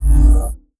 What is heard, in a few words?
Button Bottom Bar Click; Custom UI Sounds; Call of Duty Black Ops 2